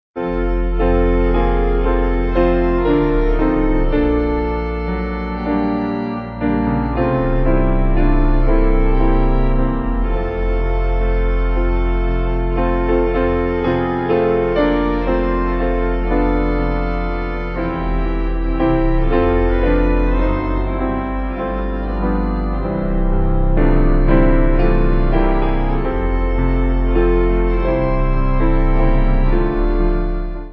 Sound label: Piano Organ; O Soul Are You Weary